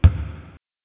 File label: bounce, basketball